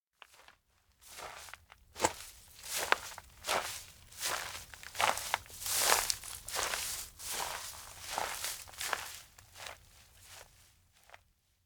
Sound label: steps outdoor grassland walk; fumiya; anemoi